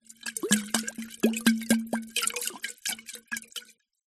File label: food drink wine bottle shake lid off wine inside; zapsplat